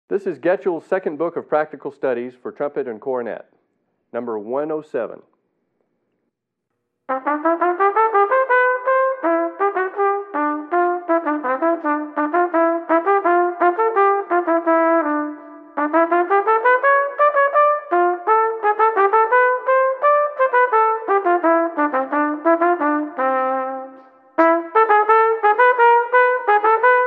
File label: Trumpet; Set II - Trumpet 7. Number 107